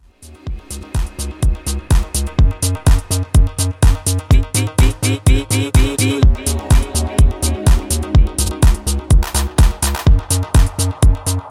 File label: Club House 125Bpm